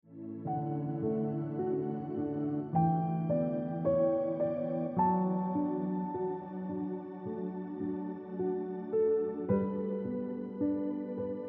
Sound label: Look Back On Ringtone Piano Music